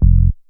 BAS TON, SOLID BAS; E-Lab; X Static Goldmine 2; akai

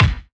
BDRUM; E-Lab; X Static Goldmine 2; akai

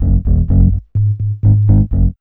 B LOOP; E-Lab; X Static Goldmine 1

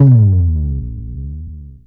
BASS FX; E-Lab; X Static Goldmine 2; akai

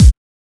HSE-BD

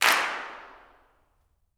CLAPS, Gospel

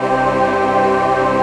VOICE PAD, ATMO PADS; Best Service; XX Large

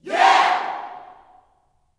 "Yeah", Vocal Gospel yell shout; Spectrasonics; Vocal Planet Vol.1 Gospel; akai

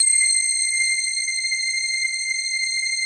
PAD; Best Service; XX Large; Pads

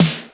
SNR

